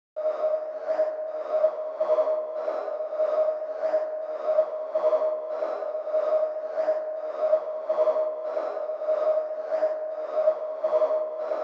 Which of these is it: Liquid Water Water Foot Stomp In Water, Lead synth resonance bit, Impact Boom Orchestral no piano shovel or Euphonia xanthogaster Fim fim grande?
Lead synth resonance bit